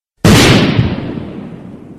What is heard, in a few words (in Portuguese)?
som de explosao